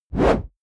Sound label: whoosh noise sweep hit low short